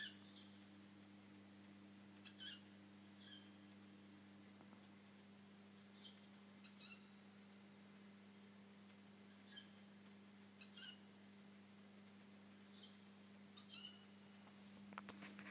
fugl sen kveld

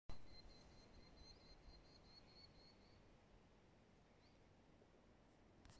fuglelyd

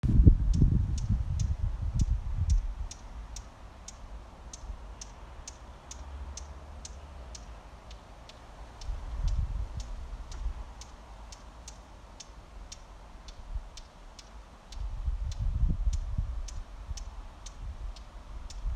fuglelyder

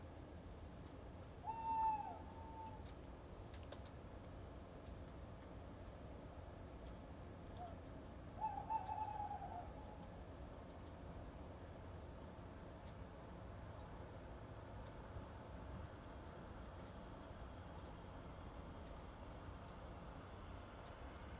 ugleyder natt; gjotenvegen 3

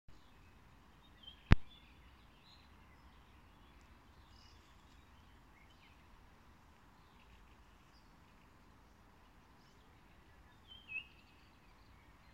fuglesang

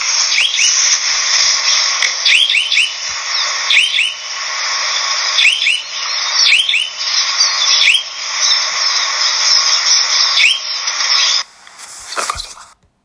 ukjent fugl

